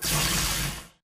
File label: bullet energy titan arc; Titanfall 2